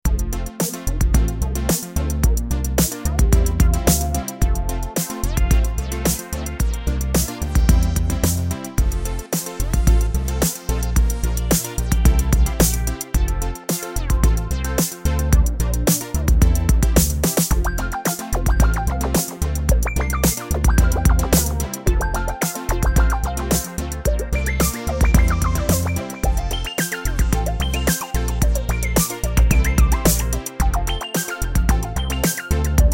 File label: Crazy Arp Sound; Contains a home made arpeggio synth sound